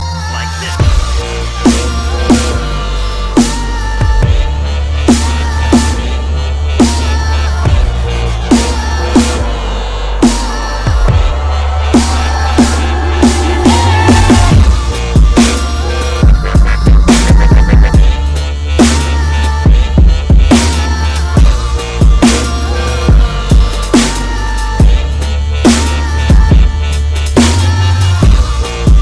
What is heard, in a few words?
dupstep